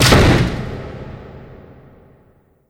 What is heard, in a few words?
gun shot; Crash Bang Boom